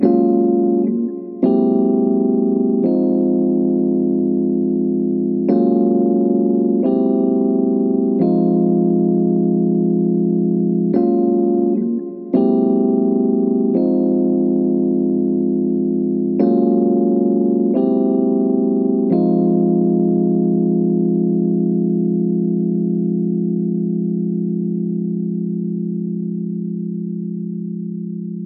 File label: E-Piano GbMaj-C7b5-Fmin; Piano & Keys